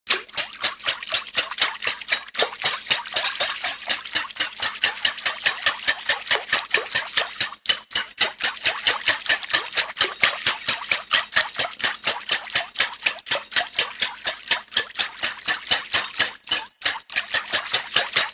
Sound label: Whisking, Whisking a mixture in bowl.; Food; Household